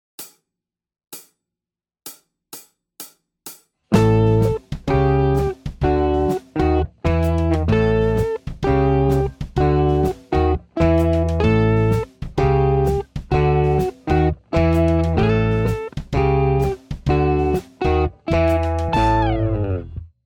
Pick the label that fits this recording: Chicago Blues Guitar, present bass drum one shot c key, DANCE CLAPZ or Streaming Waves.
Chicago Blues Guitar